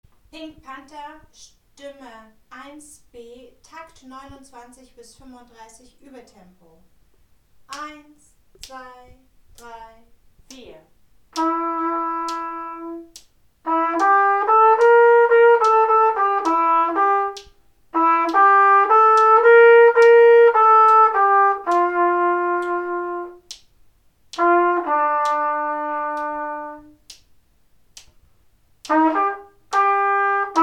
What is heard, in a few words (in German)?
Stimme 1b Uebetempo, Posaunenchor; Takt 29 bis 35